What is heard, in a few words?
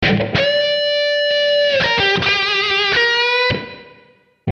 G29 98 blues lead